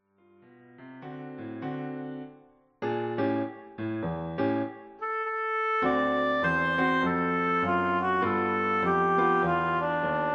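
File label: Oboe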